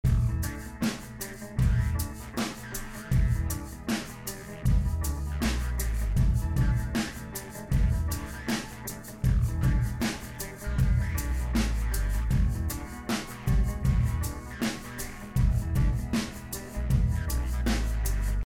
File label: drum school music; afterbeat